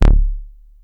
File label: Synth one shot Stab D, Bass; Slate Digital BASSHOLE Sample Pack